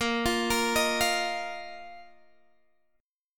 Bb Suspended 4th, Guitar, Open G

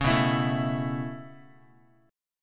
B 7th Suspended 2nd Suspended 4th B down Guitar; B7sus2sus4 strummed; 4A,4E,5A,5D,5G#,6C#; chord shape; 4,7,7,7,x,x; JGuitar

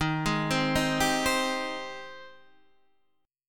G sharp Major 7th D sharp

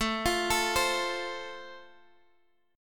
F Major, chord

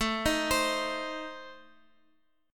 A Diminished, Guitar, Open F, Adim chord; arpeggiated; x,x,x,4,6,7; JGuitar

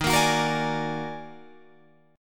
Eb Minor Major 11th, Guitar, Drop D

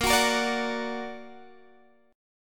Bb Augmented Bb down Guitar Half Step Down

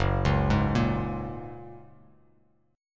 Gb Major Add 9th, down, Bass Standard; arpeggiated; JGuitar

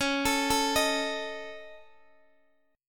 Db Suspended 2nd Sharp 5th Db Guitar DADGAD; arpeggiated; Dbsus2#5; JGuitar

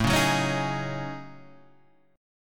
F sharp Minor 6th Add 9th, Guitar

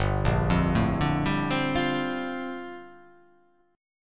G Minor 11th, chord; Gm11 arpeggiated; JGuitar

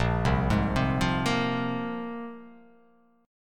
A sharp 7th Suspended 2nd, guitar chord; 6,3,6,5,5,5,x,x; down

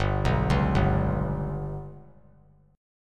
G Major Flat 5th chord; GMb5 arpeggiated; JGuitar

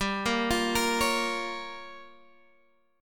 G Minor 7th Flat 5th, Guitar Standard; Gm7b5 arpeggiated; x,10,8,10,11,9; JGuitar

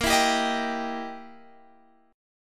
E Minor Major 7th Flat 5th A sharp

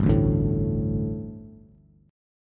G Suspended 2nd G, down, Bass; Gsus2 strummed; JGuitar

